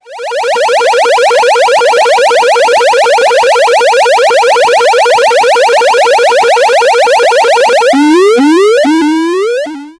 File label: siren sfx for mobile, alert